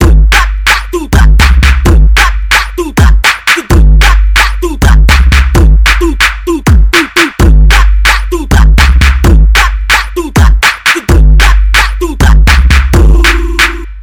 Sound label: beat